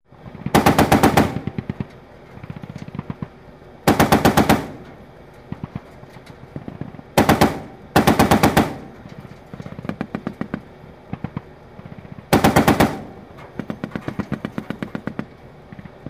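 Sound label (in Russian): выстрелы танкового пулемета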